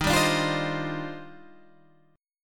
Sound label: D sharp Major 13th, down, Guitar, Standard; D#M13 strummed